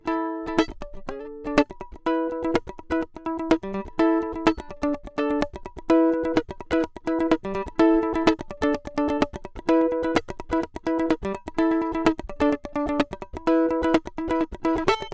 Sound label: mandolin comparision dual chords